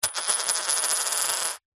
money australian 20 cent coin set down on tiled table spin; foley